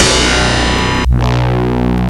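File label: synclavier; Rubber Chicken Software Sample Bank; OLD SYNTHS; OTHER OLD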